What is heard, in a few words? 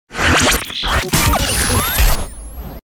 Tuner Fizz; DJ sounds